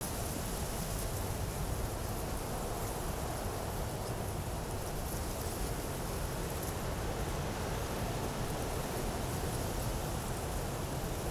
Prairie Day Amb Loop

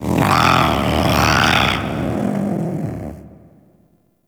Rescue Growl; Warframe; Harrow; Rook

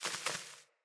Sparrow Two Wing Flaps; Animals

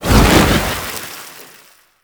Manic Teleport In; Warframe; Grineer

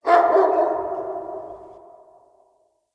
Pack Wolf Appear Bark; Animals

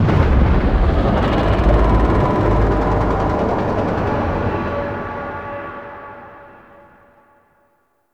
Void Dragon Summon Stinger; Enemies; Gameplay; Warframe; Duviri

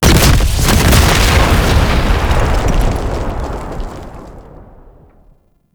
Defense Heretic Demolyst Explosion; Warframe; Nineteeen Ninety Nine

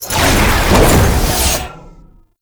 Summon Cast; Roathe; Warframe; Tau; Abilities

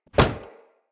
Kick Impact Wood; Envoy

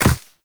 Walk Ice, footsteps; Warframe; Characters; Operator

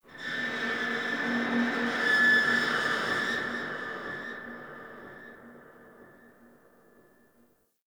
distant metallic groan; Warframe; Tau

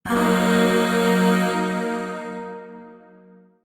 Shawzin Blues Chord G; Warframe; Zariman; Void Shawzin; Chords

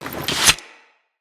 Axe Sheath; Weapons

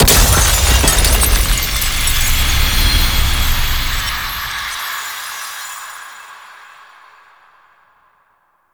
Crystal Smash; TNW Intro Quest

